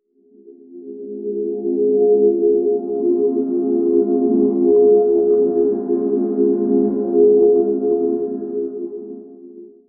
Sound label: warm heavenly glow, celestial